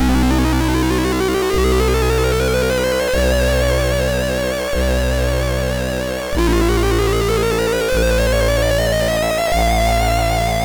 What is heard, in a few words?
Brain phone, sid, MOS6581R4